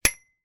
foley clothes hanger hang on metal rail external freestanding